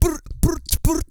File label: LATIN BRRR, Vocal; Blues & Soul